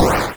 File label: ignite; spelunky 1 1